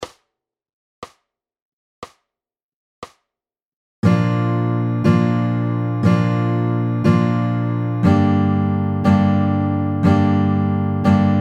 Giro di Sib maggiore Bbmaj

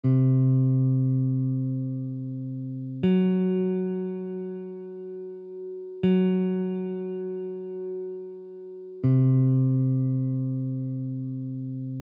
Intervallo di Tritono ascendente e discendente

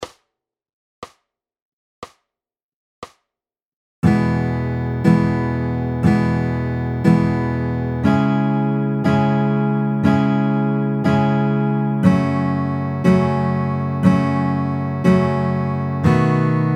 Giro di Mi maggiore Emaj

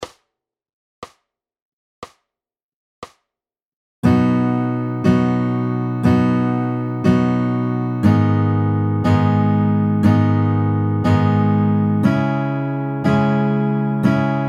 Giro di Si maggiore Bmaj

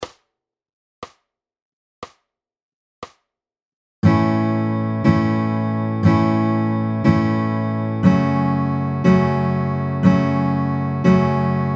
Giro di La maggiore Amaj

